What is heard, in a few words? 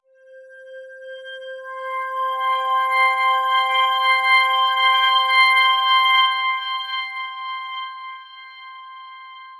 Highest, звуковая атмосфера pad